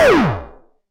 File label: ship cannon; Vehicles; mounts; freepixel